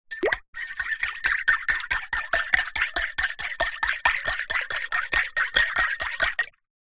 household teaspoon stir tea or coffee in ceramic cup mug fast